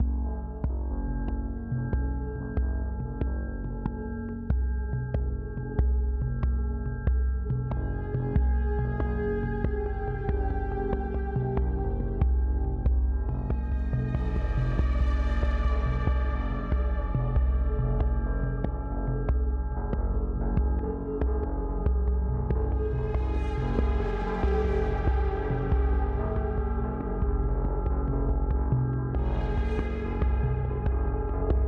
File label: MUS loop